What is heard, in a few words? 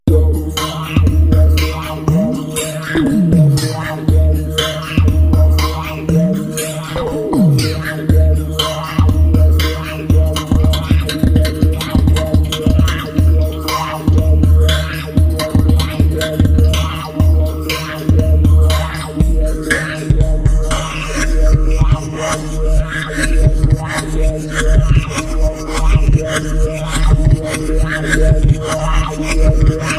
synth groovy doo